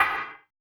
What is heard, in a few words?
factory; Drums In Your FACE!